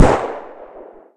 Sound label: shooting; Ambient; Zomboid; Random Sound Events; Stalker